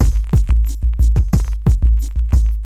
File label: DM LOOP, HipHop, Drums; LiveAct Pool